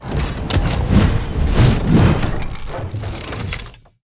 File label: Monster; Zenless Zone Zero